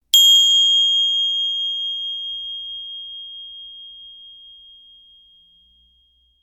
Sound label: ding